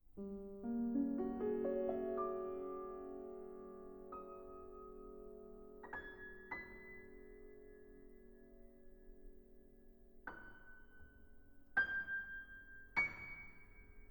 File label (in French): Notations pour piano V Doux et improvise; 12 Notations pour piano